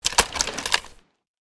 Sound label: ak47 put away; emx draftsman